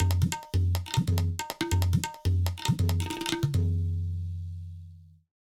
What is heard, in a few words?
tabla room before; nacre